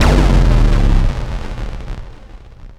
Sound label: NOISE, Production Effects